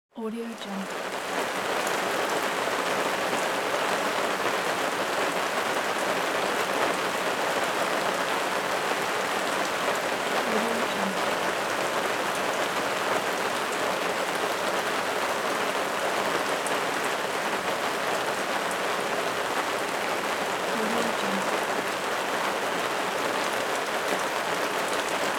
strong rain

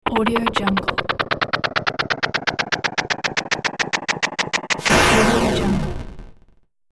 cartoon time bomb explosion

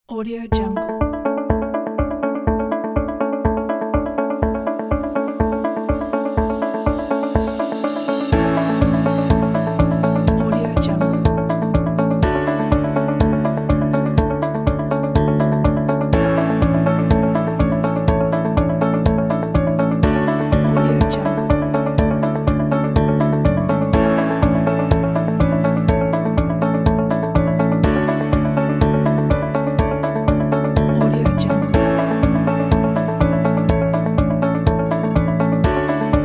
inspiration pack

